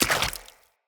Spider Step Water; Foley; Root Spider Mother

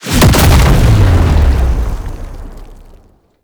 Unholy Punch Impact; Enemies; Abilities; Warframe; Tau; Roathe

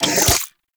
Charge; Warframe; Weapons; Tenno; Wraith Sidearm; Epitaph Prime

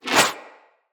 Fire Arrow Whiz By; Level Events; Midrath; Warsong Prologue

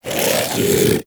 vocal breathe; Warframe; Hunting Critters; Vulpaphyla

